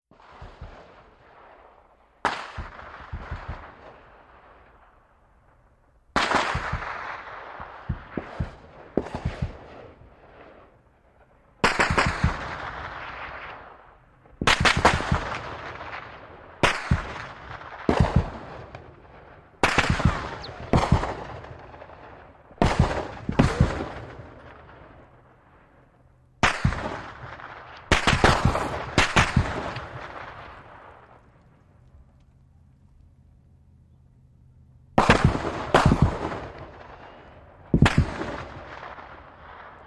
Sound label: .50 Caliber: Fired In Canyon. Various Bullets By And Ricochets.